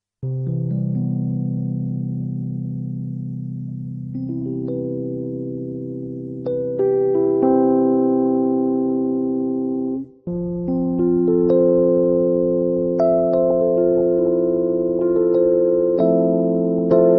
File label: Rhodes